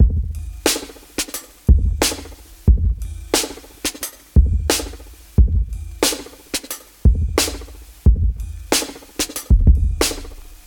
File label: 89 bpm drum loop d sharp key